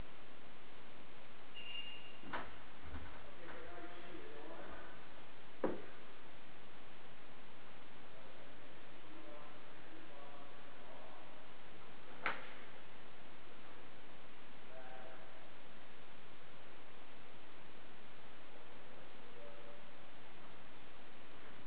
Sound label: Sound and a large slide in dressing room